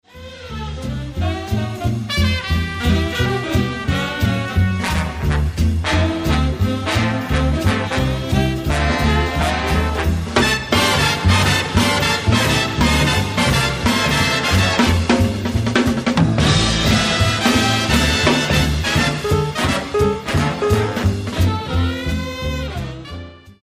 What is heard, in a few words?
Straight Ahead Tutti, jazz